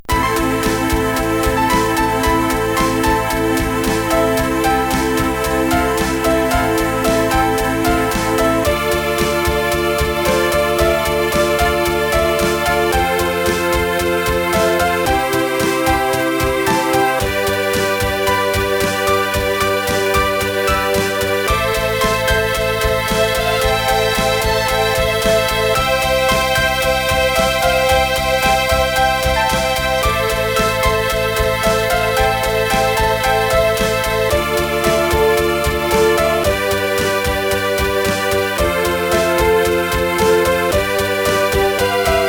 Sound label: sweet melody, OctaMED; OctaMED SoundStudio